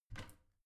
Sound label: bathroom cabinet both open regular; objekte; tueren